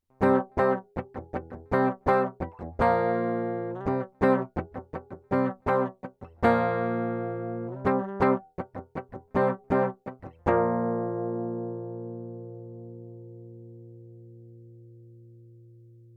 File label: guitar rock riff clean